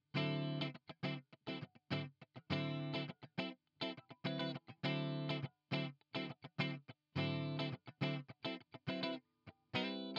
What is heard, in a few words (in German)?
Harley Benton CST 24HB clean SPLIT beide